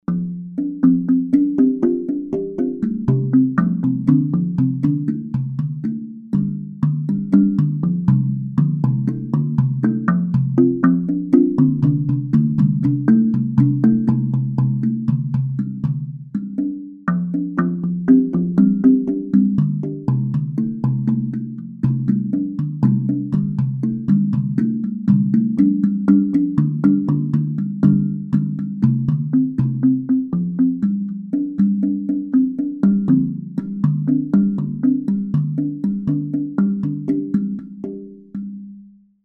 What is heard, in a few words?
african groove